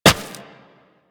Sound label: Operator Run Rock, Footsteps; Warframe